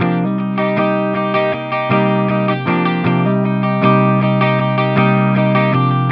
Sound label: Guitar C min7, Rock